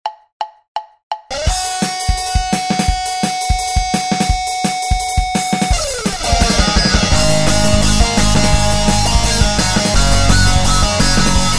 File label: Rough Tone (Rock Theme)